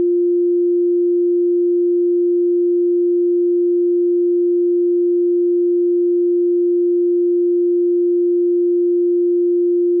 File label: Lower midrange 350hz